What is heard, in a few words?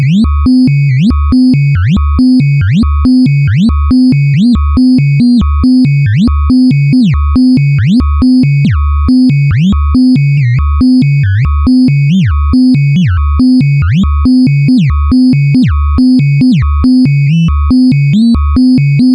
ARP 2600, SEQ; TECHNO-BOX